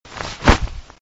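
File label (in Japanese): nuno, cloth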